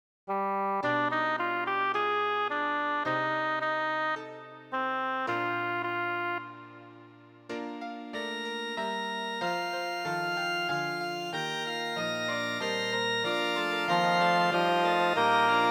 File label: coro bass; bach johann sebastian; johannes passion; 34 coro sei gegruesset